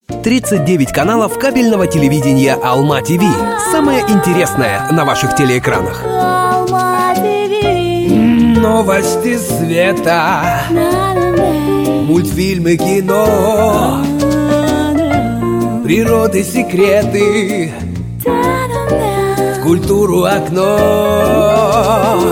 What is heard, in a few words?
song; alma tv